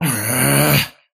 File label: parry fail; dota; kez